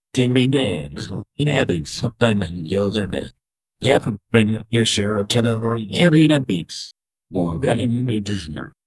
start a quest for, adventure

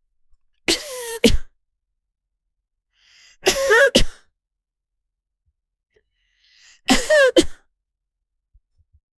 female sneezing, allergy

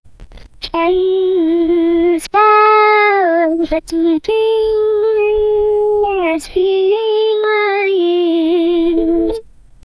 a childrens song played b; abstract

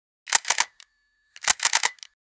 a crisp high fidelity cam, camera

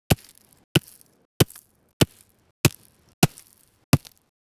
pickaxe hitting hard dirt

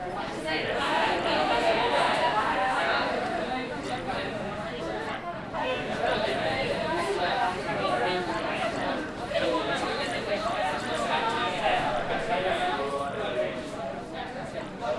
background, sound-effects

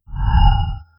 short sinister spectral v, vocals

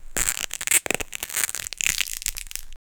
net crunch, sports

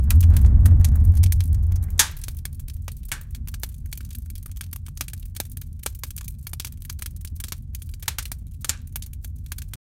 raging crackling fire in

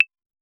a gentle bouncy pop, buttons

